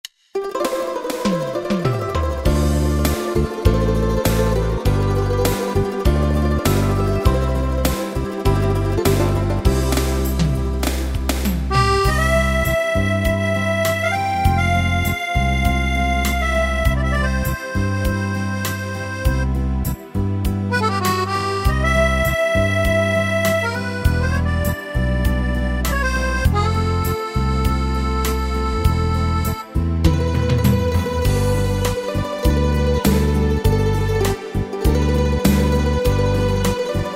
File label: 8 Beat, Yamaha PSR-3000 ballad style; Factory Styles; 8BeatAdria